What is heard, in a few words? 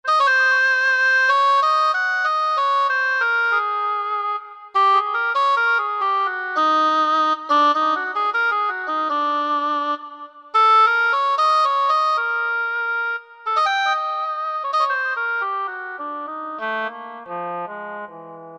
Oboe, Yamaha TG500